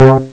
d10 bass; Amiga Soundtracker Sample Packs

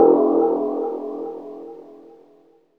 DR. LOOP, TRANCE; X-Static Goldmine 3

